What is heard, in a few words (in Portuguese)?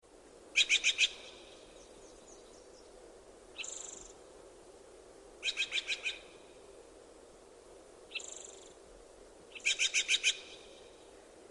Canto do gaio de steller